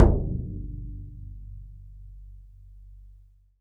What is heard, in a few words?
BODHRAN; E-MU Producer Series Vol. 7 – Old World Instruments